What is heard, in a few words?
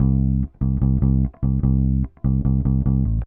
Bass, Indie Rock